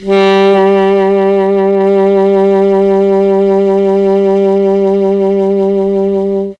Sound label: G1 ALTO SAX, mellotron; CHMB ALTOSAX